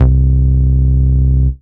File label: Ultimate Bass Root C; Vengeance Samples